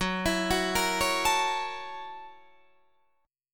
Gb 7th Sharp 9th Gb Guitar Open D

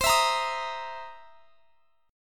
C 7th Sharp 9th C; C7#9 strummed; x,x,22,21,23,23

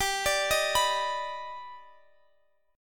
D sharp Augmented Major 7th

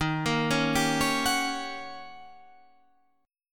Eb 7th Sharp 9th, Eb

